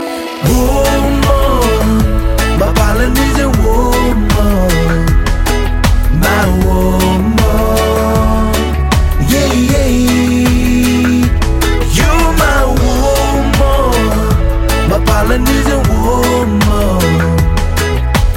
Polynesian Woman

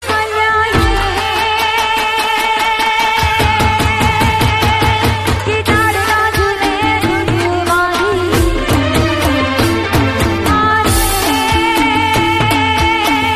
Garba

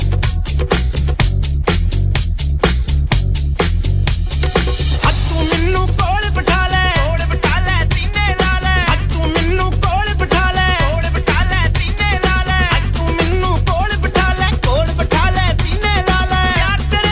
Punjabi Dj

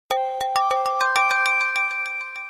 Multi-chime

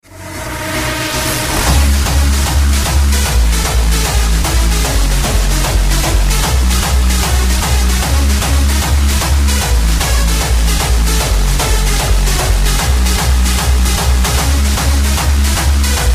Super Hard Bass; meloboom

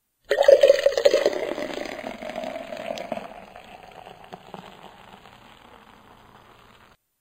Pouring Champagne

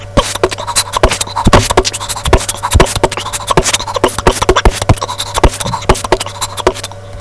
Beat Boxing

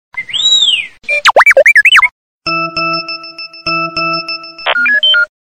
Robotic Sms

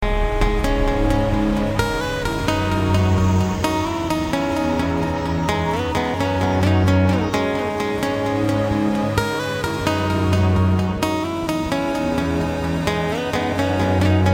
Ocean Mix Club

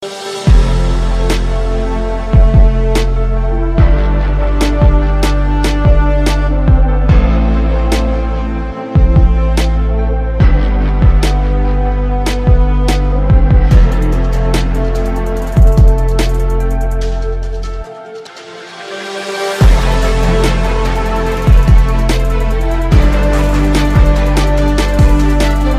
HIP HOP; PUB G-Unreal

